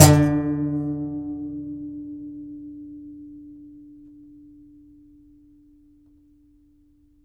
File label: C. Bass Balalaika, Plucked Strings